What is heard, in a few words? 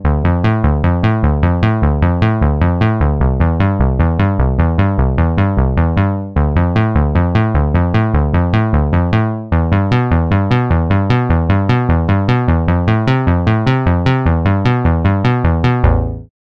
Ethereal Bass; Astra Turbo Quasar